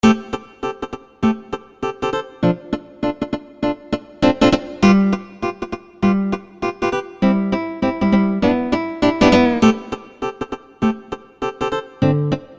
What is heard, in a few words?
Guitar Strumming 4'4